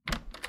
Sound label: Door Open, Wood Door; Free Wood Door Pack